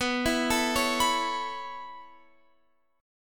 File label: B 9th Suspended 4th B down Guitar Open F; arpeggiated